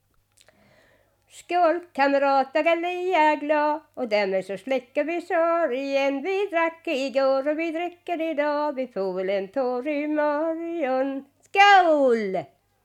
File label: Folk music of Småland; Skål kamrater